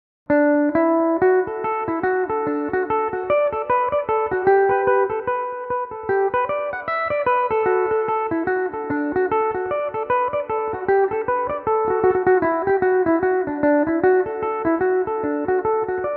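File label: D major, Irish mandolin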